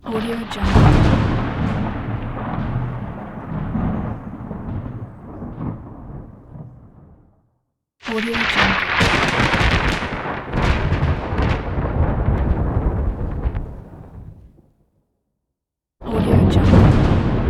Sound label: thunder